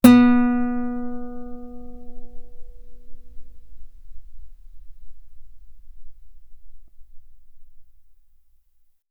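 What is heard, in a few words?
Guitar ff B string B3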